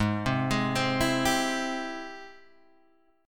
G sharp Augmented Major 7th G sharp Guitar Standard; G#+M7 arpeggiated; 4,3,6,5,5,3; JGuitar